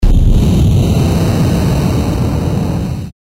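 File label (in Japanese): 炎魔法 大, 魔法効果音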